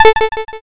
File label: toggle off